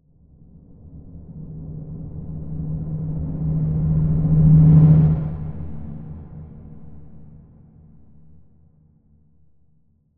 Impact Reversed Abstract Timbale Drum Hit - Rising; Hollywood Edge; sound designer's toolkit v2